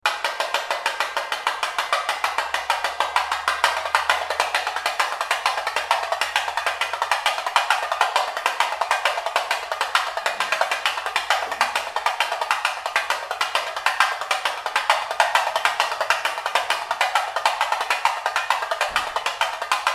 Tamborim

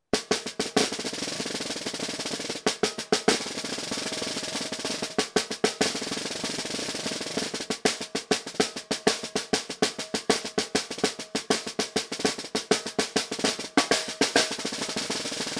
caixa; MAC12

